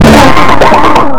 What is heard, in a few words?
LOOP DISTO 1; Big Beat Samples & More; AKAI; ALL LOOP KIT